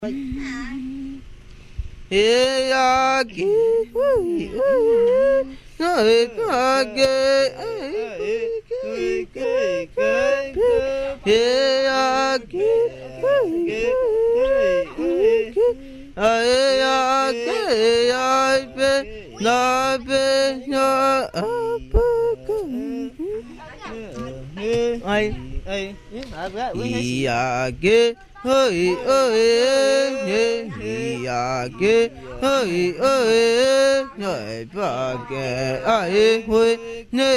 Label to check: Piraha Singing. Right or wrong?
Right